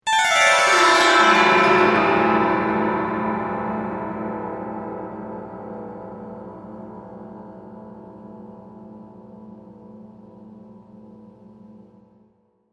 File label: Haunting dulcimer glissando; Strings; Musical